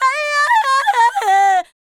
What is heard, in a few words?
Female Vocal 100bpm G Dry, African Voices